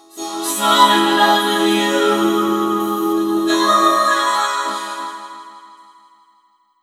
FALL IN LOVE, VOCODER, Funky Vocals; USB Soundscan vol.18; AKAI

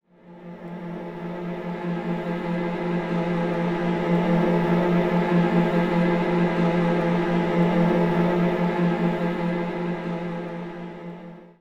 Orchestral Loops STRING ACCENT

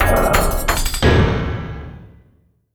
Industrial Loops; USB Soundscan vol.24; AKAI

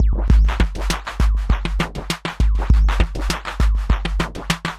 Robo Beat 100BPM, sci-fi Beats

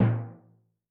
Timp H, Yamaha DTXpress-II, Perc

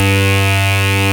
RAVE BEEP; Best Service Alpha Dance vol.2

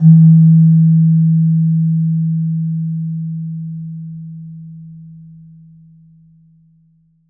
PELOG D#1, PELOG BASS; Soundscan vol.60; AKAI

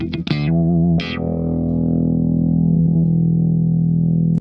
NOTE E1, Funk Guitar; 90 s Sample CDs; Zero G

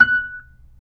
B-3, percussion; Keyboards of The 60's and 70's

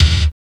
CYBER KIT, DRUMS

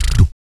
VOC PERC, BASS PERC; ILIO; Vocal Planet VOL-3; Jazz & FX

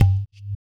EQ Perc, Percussion Mix

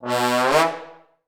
TB GLUP D#2; Best Service; Peter Siedlaczek's Advanced Orchestra

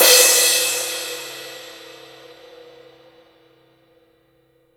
CYM, Real Drum Kit

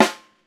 STR SNAR, Brazil Drum Perc; E-MU Producer Series Vol. 7 – Old World Instruments

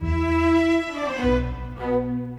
Strings; Sonic Foundry (Sony Creative Software); Orchestral VOL-2; Modern 02

